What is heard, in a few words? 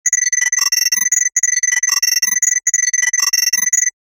Computer Processing Electronic Long; Effectsworks; Tech Future